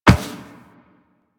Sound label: Walk Metal Perforated, Footsteps; Warframe; Operator